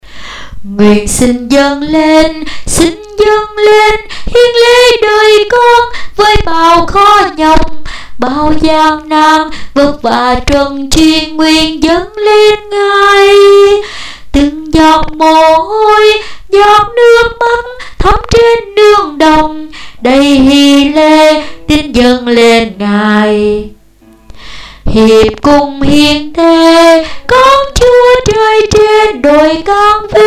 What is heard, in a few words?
tenor